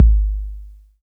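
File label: bass drum 808 Tape Decay D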